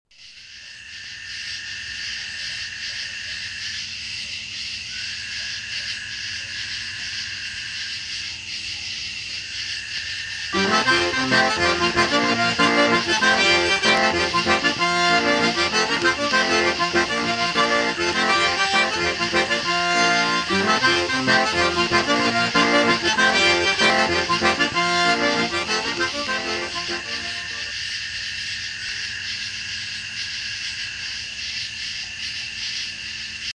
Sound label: cajun sounds